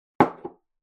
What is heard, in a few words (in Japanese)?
グラスを置く音